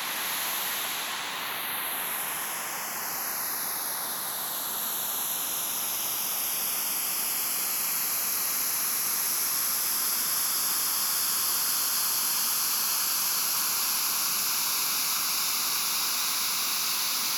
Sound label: white noise ear signals, 1ch; enzner2008